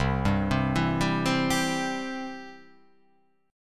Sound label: C Major